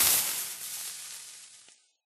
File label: lava death; Minecraft